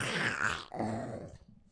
Beholder Death

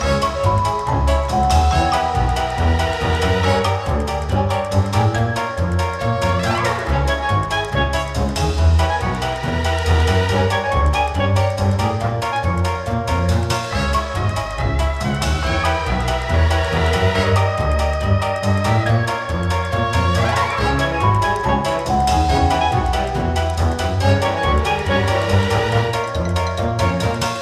Spring Fight 140bpm; PS Vita; gamerip; dont starve giant edition; DS Spring Fight 140bpm

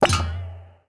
Bounce Off bumper

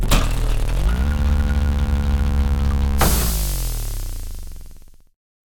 Elevator Motor Open

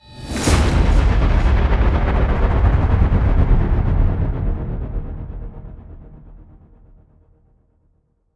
Stinger Modulate; Extinction Deluxe Edition

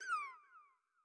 Bat Wind Up

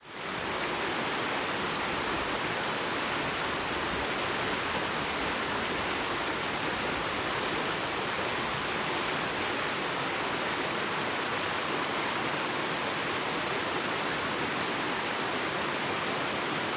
Rhone and Arve rivers Large river

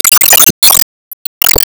Enemy Bossegg Divide

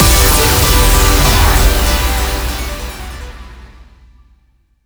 Music Decide; beatmania IIDX 29 CastHour; System BGM; Syssd Music Decide Se

